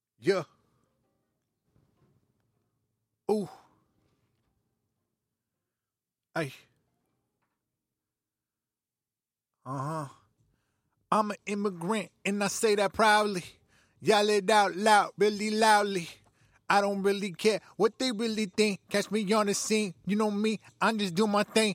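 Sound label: hip hop Abm 80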